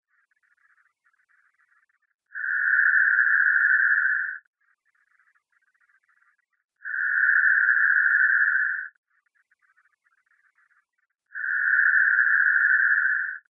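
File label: phase antiphase; Magnitola Avtozvuk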